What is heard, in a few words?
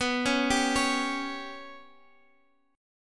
B Suspended 2nd Sharp 5th B Guitar Drop D, chord